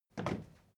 step crowd march quiet single left; schritte

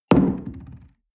coffee place tablecloth hard wobble

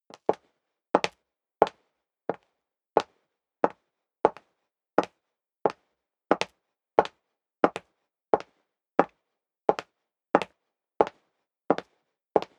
step high heels wood parquet walk sequence; schritte

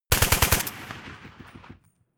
rifle ak-47 exterior fire burst

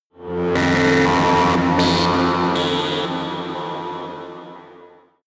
sci-fi cosmic strong energy; horror

